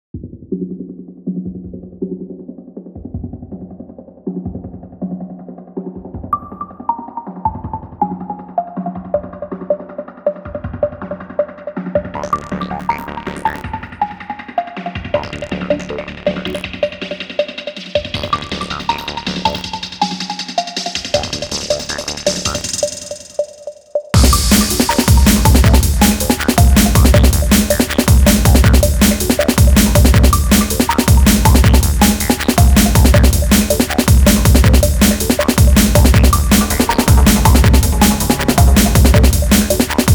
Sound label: Caves